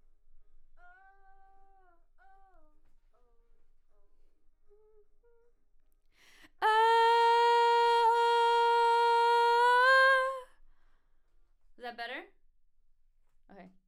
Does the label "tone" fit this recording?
No